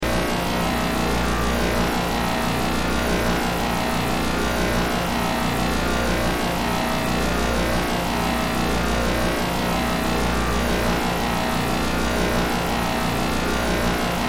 Sci Fi Force Field, Sci Fi Force Field Energy Source Constant Loop